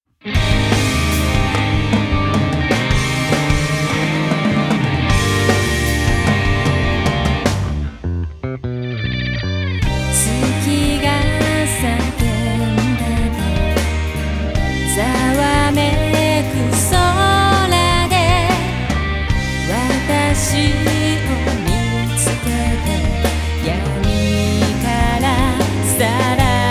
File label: lunatic trance; Kenji Kawai